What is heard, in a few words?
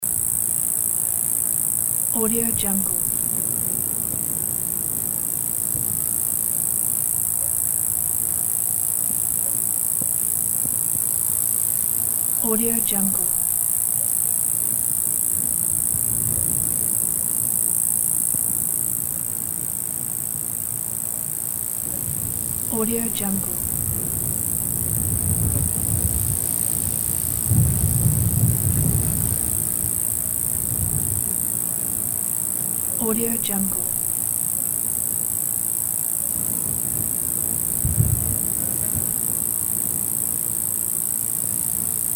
summer cicadas